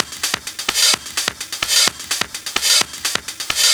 House Loop, 128 BPM; Vengeance Samples

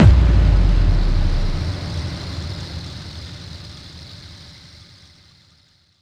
Bassdrums FX, FX Kicks; Vengeance Samples

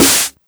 snares; Vengeance Samples

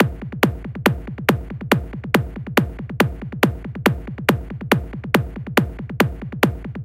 140BPM Genetic Kit

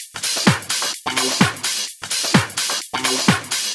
Minimal Loops

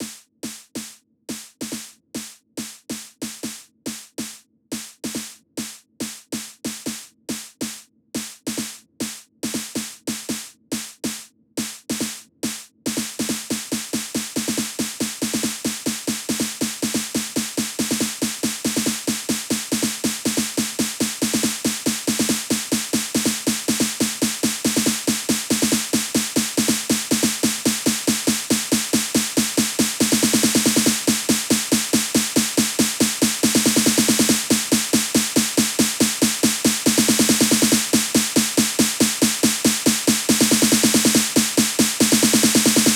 140BPM TwoClap SNARE 32Bars; Vengeance Samples; Snares&FX Pairs

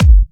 Bassdrum; Vengeance Samples

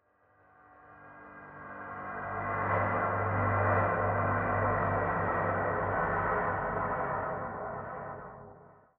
Pad; Dubstep Sample Pack

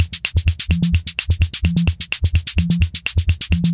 Latin House Loop 128 BPM; Vengeance Samples

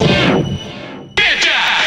House Loops, Vocal Loops House 128BPM

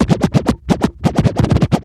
Vinyl FX and Scratches, FX Scratch; Vengeance Samples

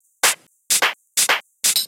House loops 128BPM; Vengeance Samples

